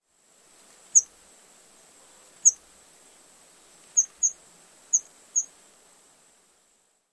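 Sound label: pine warbler flight calls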